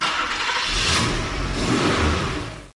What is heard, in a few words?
lamborghini